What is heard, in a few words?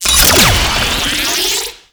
Case Friendly Capture Start; Tau; Twelve Minute War; Cases